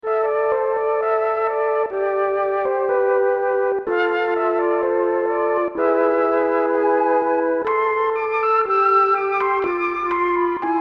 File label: memotron flute vintage; manikin electronic